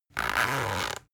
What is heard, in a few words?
zipper run; objekte; reissverschluss